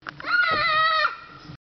Yell

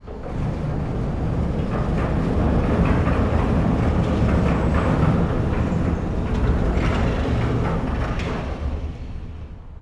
Open Rolling Door

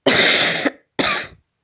Child Coffing

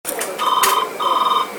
Fax Machine Ring

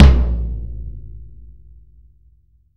Floor Tom

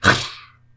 Zombie Bite

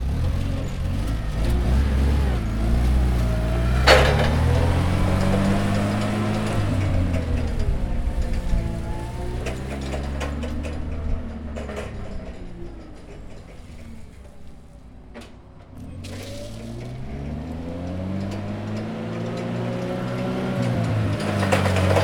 Forklift Drive By

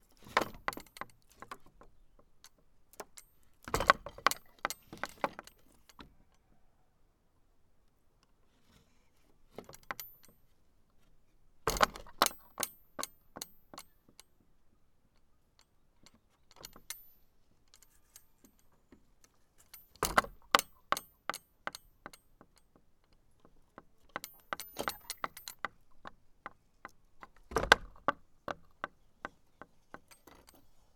Gate Wood

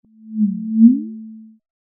Dark Magic

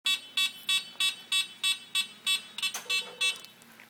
Forklift Error Code

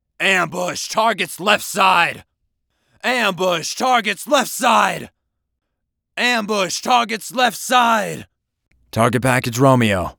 Military Vocals

